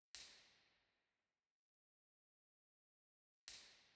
Musikverein, Theatres

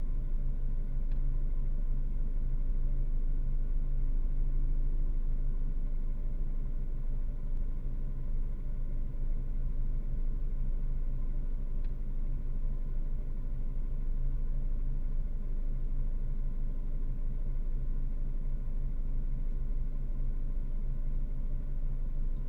background

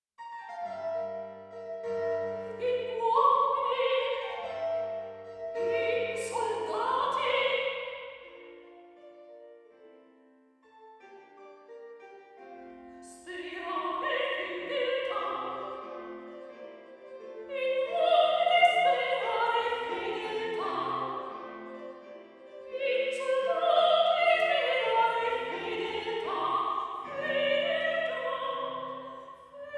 Ambisonics L

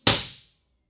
IR

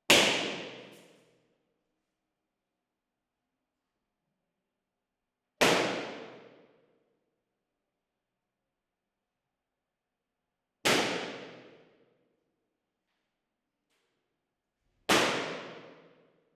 Balloons; Bformat; IR-STI

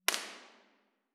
Impulse Responses, Trieste Front Right; Ambiovolver

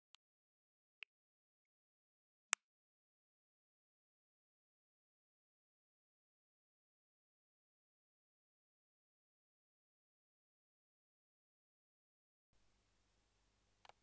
Multiple IR, test sweep

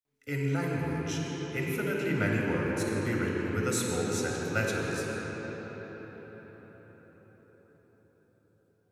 Ambisonics L

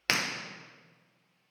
Sweep Binaural IR; Impulse Responses

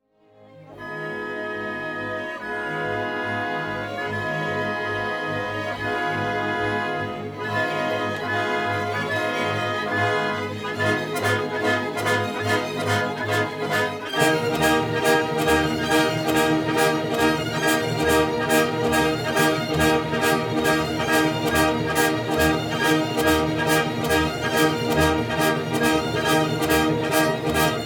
Stereo Recordings ORTF; Bruckner Symphony No4

